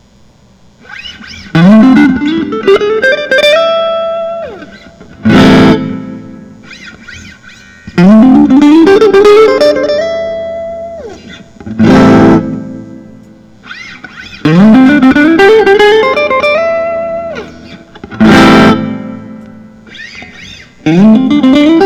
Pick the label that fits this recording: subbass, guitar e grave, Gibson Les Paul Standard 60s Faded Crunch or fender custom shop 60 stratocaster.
fender custom shop 60 stratocaster